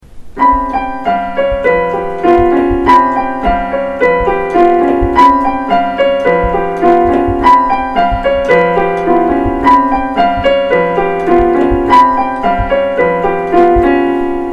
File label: Ostinato; Holy Breath